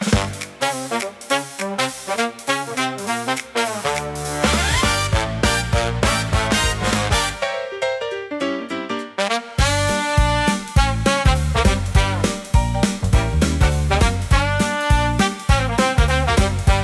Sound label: Brass Pocket Shuffle, electro swing pop